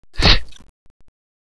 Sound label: strike; tunga